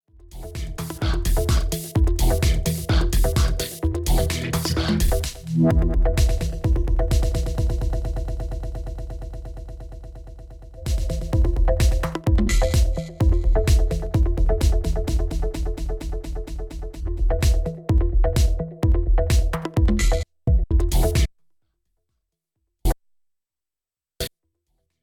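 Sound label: Denon DJ X1850 Sweep FX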